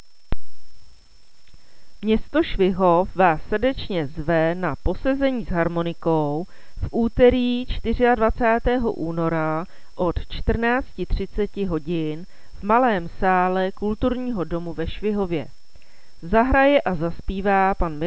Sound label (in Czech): harmoniky